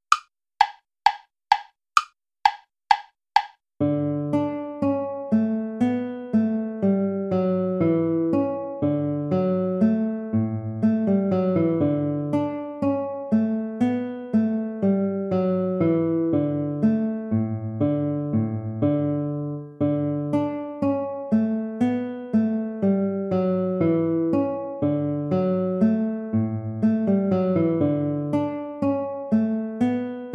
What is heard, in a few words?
allegro play along